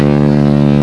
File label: aircraft; Panzer